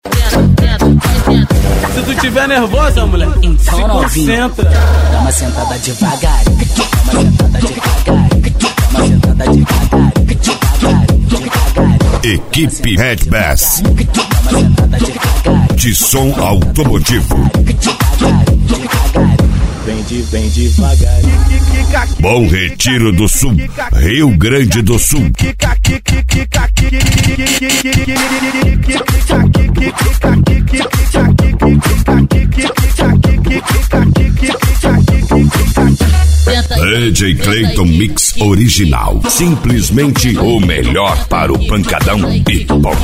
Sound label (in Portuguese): som automotivo; equipe redbass